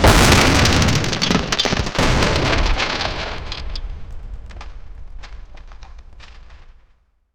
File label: soccer ball smashes clay; destruction